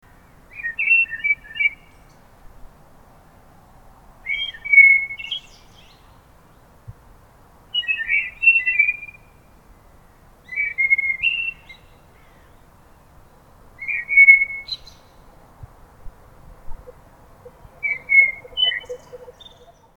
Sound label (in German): vogelgezwitscher